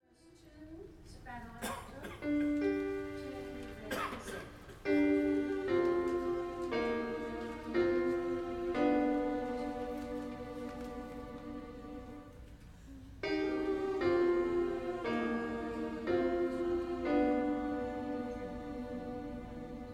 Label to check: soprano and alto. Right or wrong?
Right